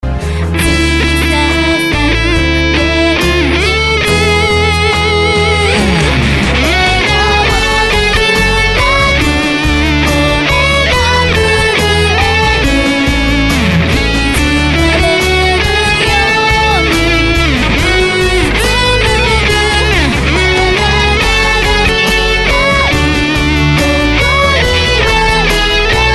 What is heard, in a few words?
guitar